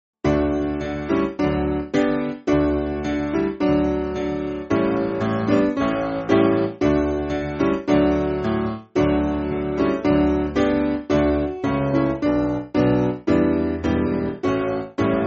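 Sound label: Piano, Music Backing Files for Churches; O The Blood Of Jesus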